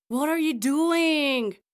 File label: Angry